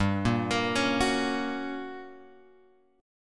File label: G Minor Major 7th Flat 5th, G; GmM7b5 Chord {3 1 X 3 2 2} arpeggiated